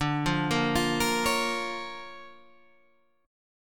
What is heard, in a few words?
D Minor Major 7th Sharp 5th, D